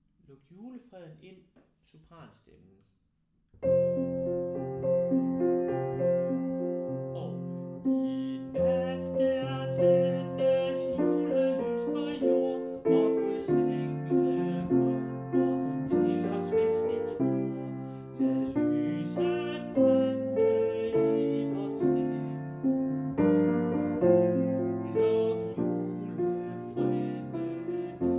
sopran; Luk julefreden ind; harlev koret

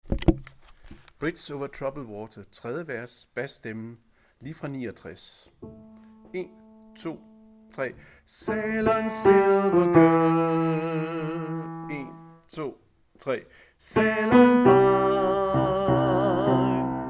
bas

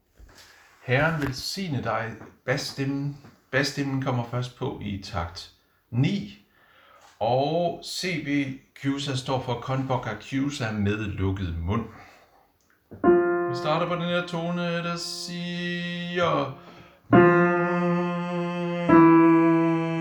bas, harlev koret; Herren velsigne dig

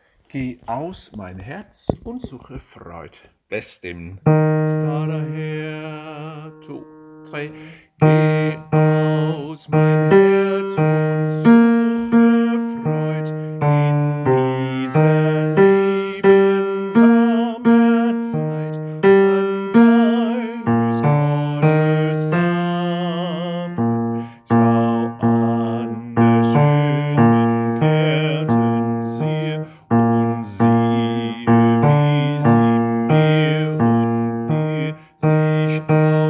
basstemmen; harlev koret

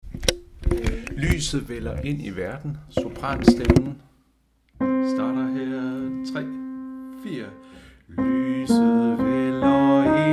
sopran, koret; Lyset vælder ind i verden; harlev koret

